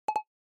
Smartphone UI Sound Dry

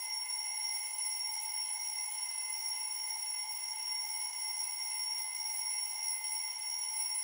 Clock Alarm Far Mid Loop

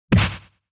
SNES Fighting Hit

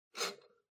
Kitchen Scale Needle

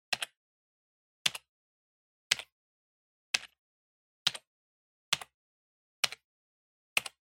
PC Keyboard Single Mid

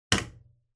Light Switch Wide Panel, Wall Hard

